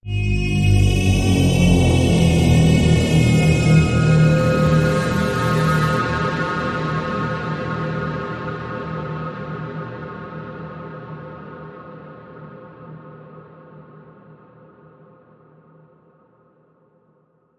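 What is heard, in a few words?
Bend; Exposure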